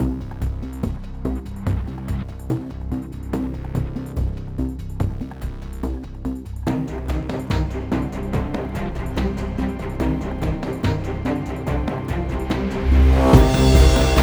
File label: Music Pre Race 72bpm Game Mix; Forza Motorsport 6 Apex